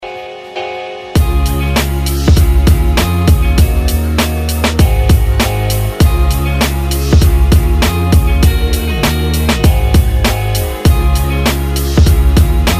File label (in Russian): populyarnaya vlogovaya muzyka